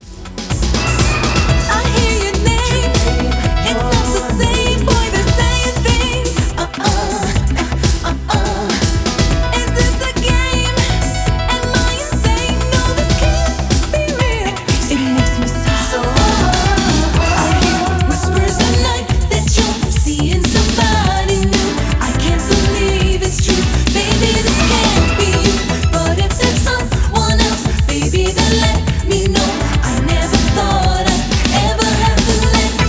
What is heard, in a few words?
Whispers